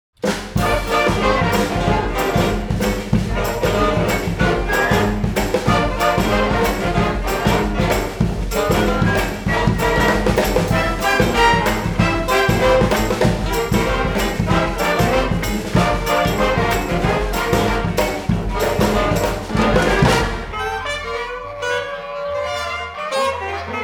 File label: Ping Pong, Fanfare; Fanfare au ping pong